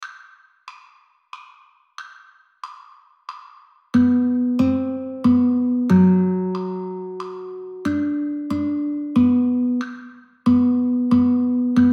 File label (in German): Hasellwoods Galliard Gitarre